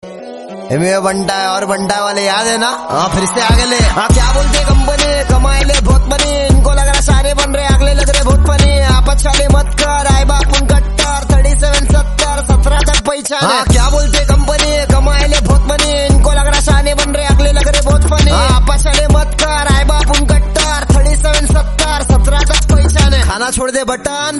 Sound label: Rap